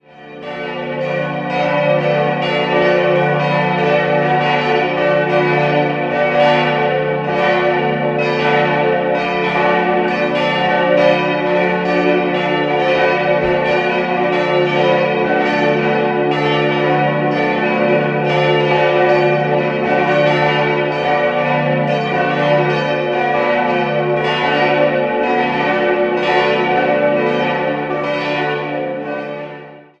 Aschaffenburg Gailbach, glocken

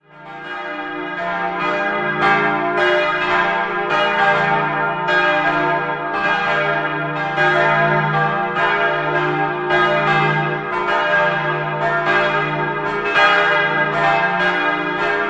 Wiesa, Trinitatiskirche, glocken; 1902

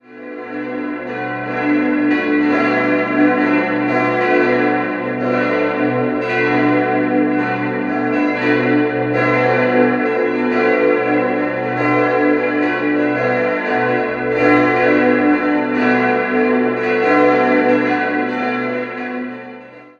Werdau, glocken

